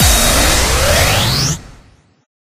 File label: Battle; Life of a Naomi